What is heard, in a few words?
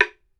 BAMBOO, World Assortment